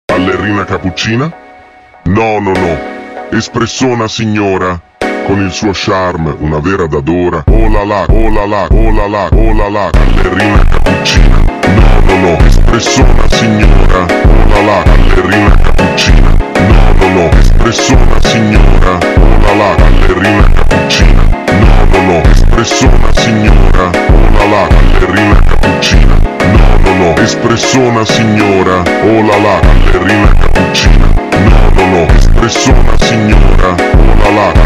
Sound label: Funk ultra Slowed